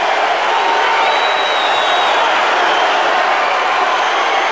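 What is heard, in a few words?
Basketball Crowd Jeer Loop; SFX Kit; Crowds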